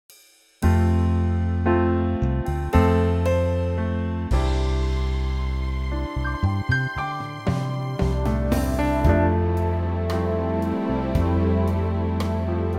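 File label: C orch, Backing Tracks for Male Vocals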